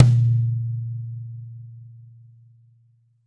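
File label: TY Mid Tom, Roland BK-5 Toms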